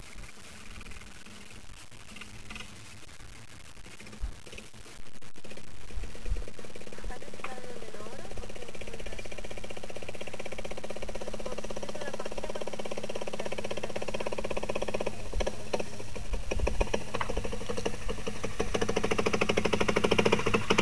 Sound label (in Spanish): sonido moto